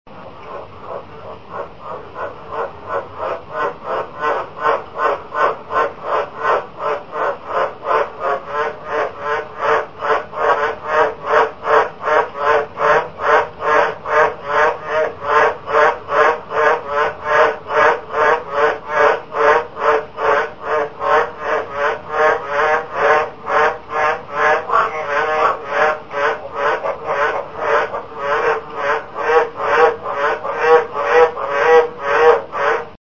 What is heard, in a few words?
croak